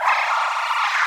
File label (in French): cri de detresse